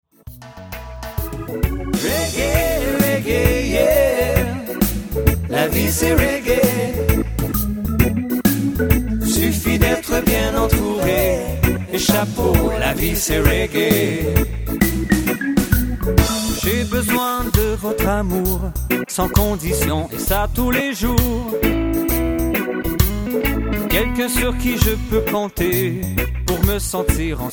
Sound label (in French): le reggae de l'estime de soi